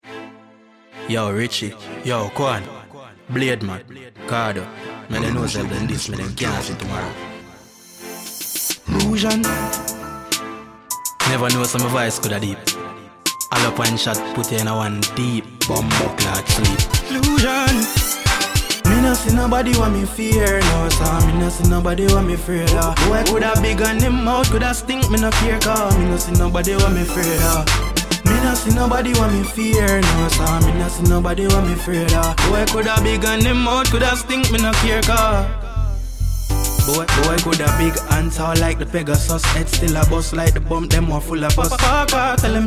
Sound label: Riddim